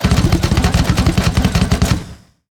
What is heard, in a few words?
tower tricannon skill bombardment